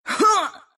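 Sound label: grunt, Russian; Legcom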